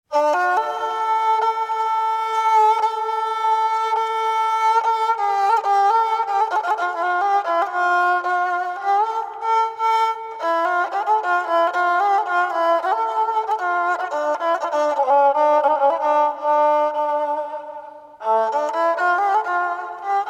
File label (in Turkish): Nihavent Kemence Taksimi